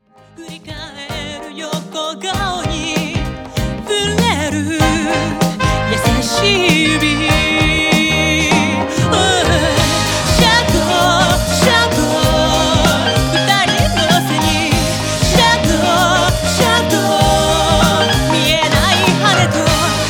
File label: Promo, guitar